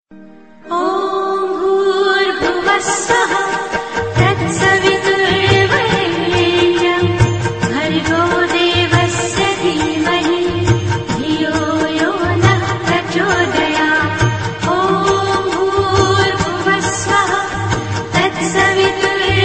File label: gayathri mantra